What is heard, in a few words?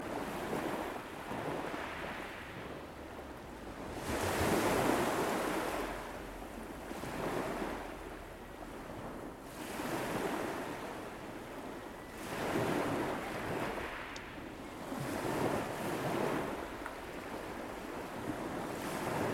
seaside at sunset wonderful welsh waves on the beach at aberystwyth